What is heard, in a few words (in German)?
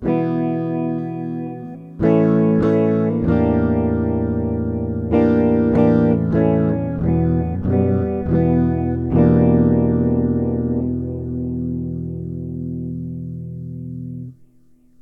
iZotope Spire Studio E Gitarre mit Pedaleffekt Purple Phaze